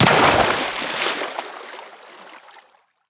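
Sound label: water jump down, footstep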